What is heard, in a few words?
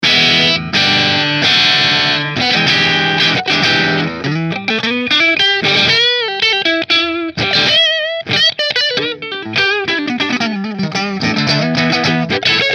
Nola Voodoo Tabacco Neck Split Marshall